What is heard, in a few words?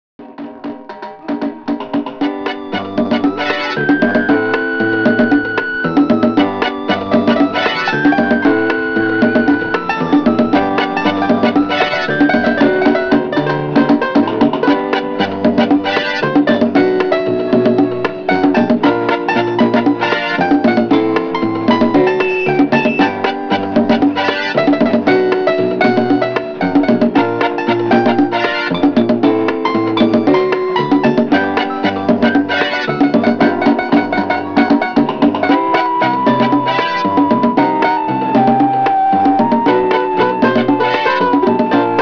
salsa